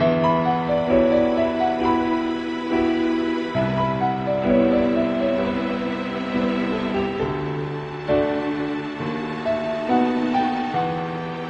sad loop; Time Tenshi 2

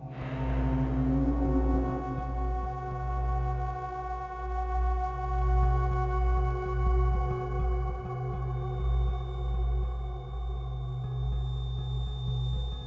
Static loop; Heroes Over Europe

